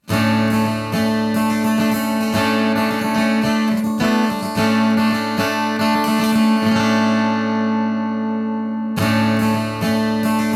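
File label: Guitar no FX Dynamic setting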